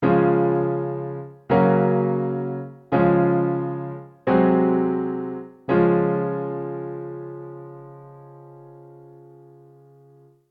d flat major primary chords

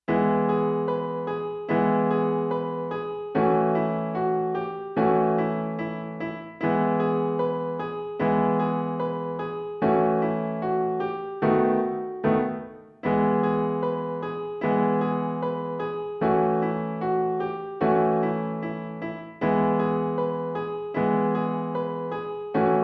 blues in e; bastien2

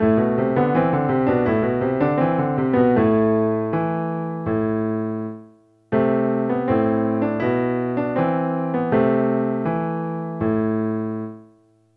dotted 8th note in Am

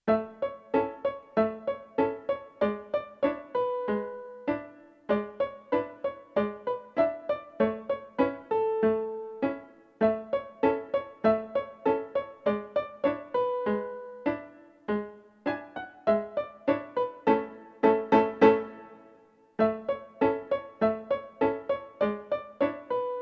transpose practice jamaican jive in a major

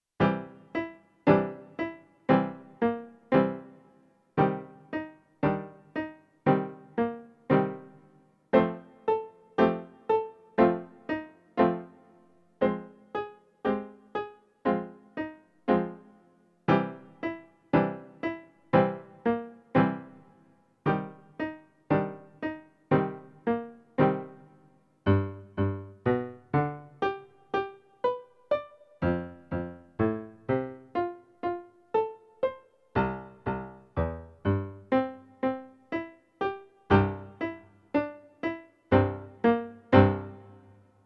major minor bop

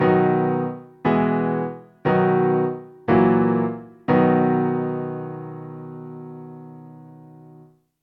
B Major primary chords

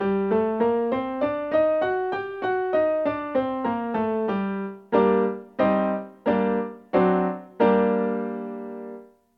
G harmonic minor scale and primary chords

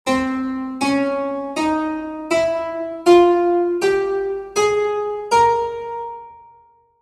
spanish scale

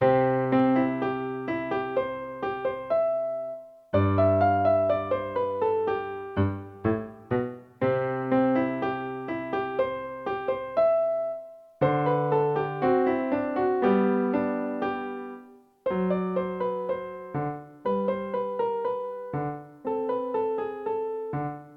sonatina in C practice

